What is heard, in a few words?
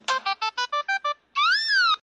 Ouuuchhhh